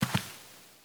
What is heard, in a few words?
slide; FantasyTennis